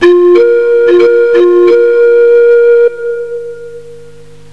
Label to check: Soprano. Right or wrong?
Wrong